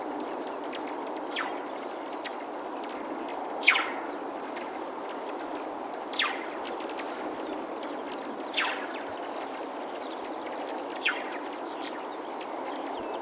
sahkojohto; mke300

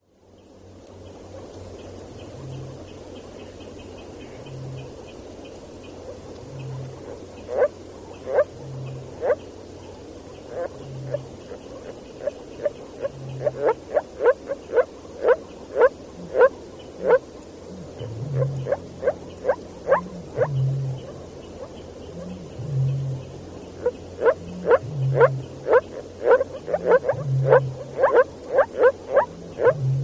viitasammakko; parainen

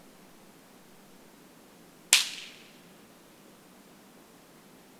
pakkanen paukkuu; savukoski

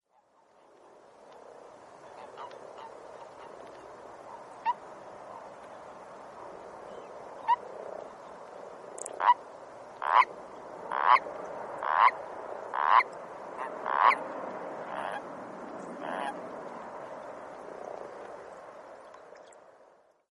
rupikonna

